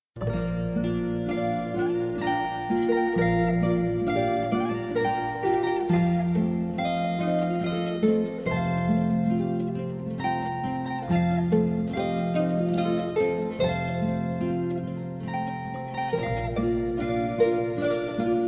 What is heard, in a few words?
Glockenspiel